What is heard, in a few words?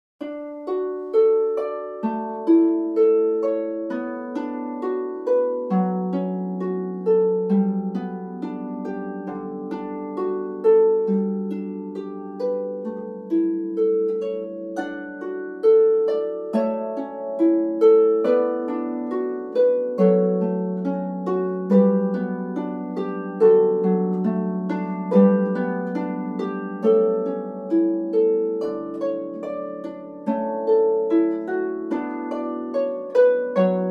Canon in D. Pachelbel, harp